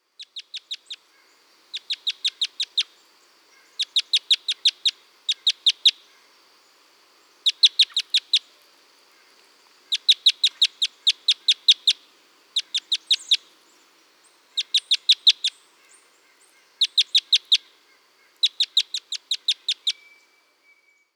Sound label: Red Crossbill Type 1